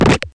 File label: SFX Head firehead; GONNER